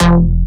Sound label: Bass Shot F; Mutekki Media; Vengeance Electroshock Vol.2; Bass Shots